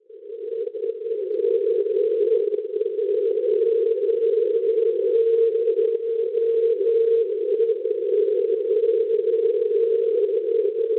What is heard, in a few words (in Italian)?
NDB, Tolosa Francia 415 kHz; TOE; Schwarzsee